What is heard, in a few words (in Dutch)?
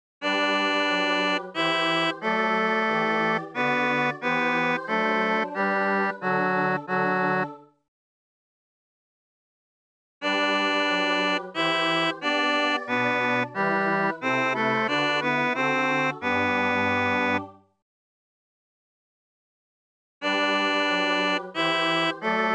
tenor; wohl mir